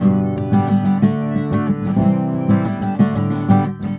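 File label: guitar, 120, Celtic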